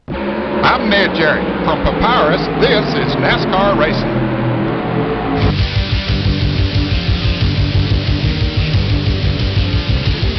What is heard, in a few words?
Intro, AudioPCI; nascar